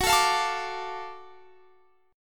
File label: F sharp Minor Major 7th Sharp 5th, Guitar Drop D; chordshape; down